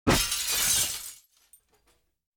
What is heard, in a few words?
collision crystal; YBR125